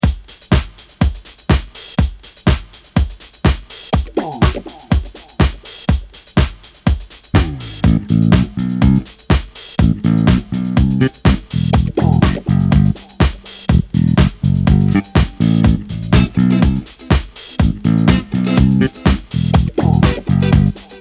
Disco bass; 베이스; DirectBass 2.0 FREE for NI Kontakt; Pettinhouse

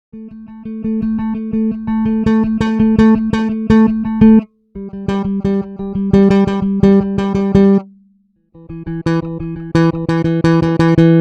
Round robin

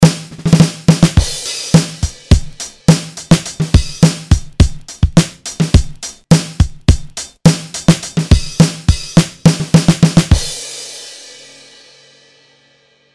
POP 80

